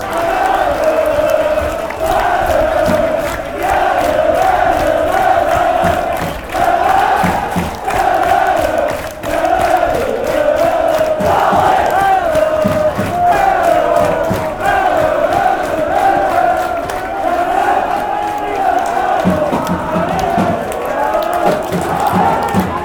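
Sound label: fanchants, football chants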